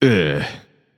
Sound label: ugh; smite; raijin